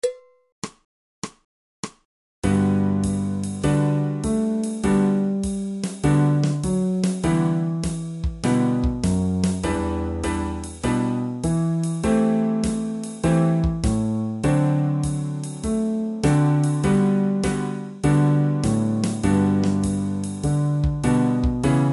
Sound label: 2beat, fretless bass